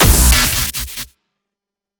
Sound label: digital impact hit